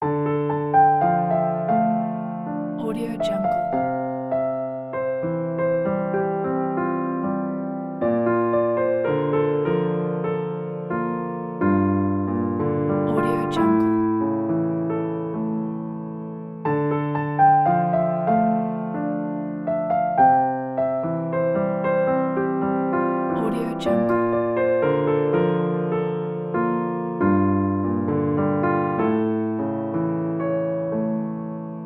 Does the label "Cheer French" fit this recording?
No